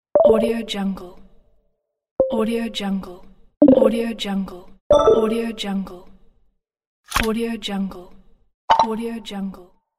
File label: button menu application sfx